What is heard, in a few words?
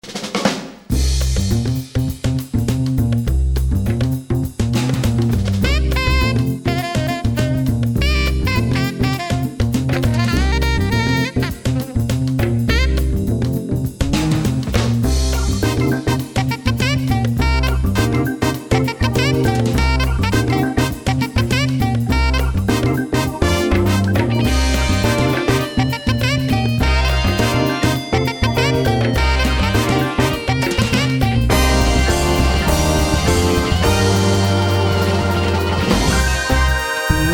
jazz; KOMPAKT Jazz by Andy Davies; native instruments kompakt